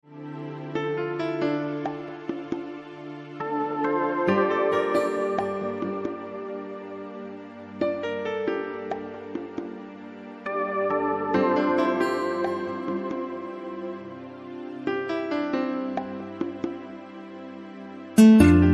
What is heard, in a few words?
sounds of guitar